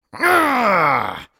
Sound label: Lich effort